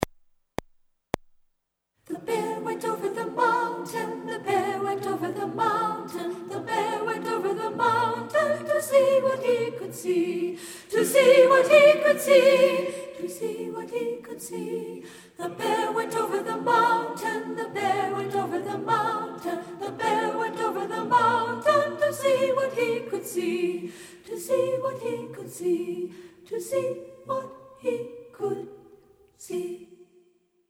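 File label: The Bear Went Over the Mountain SOPRANO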